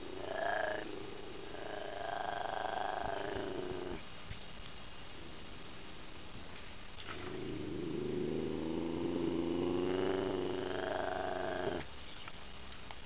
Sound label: ocelot; worldofcats